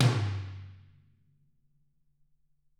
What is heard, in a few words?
Toms; Bob Clearmountain Drums I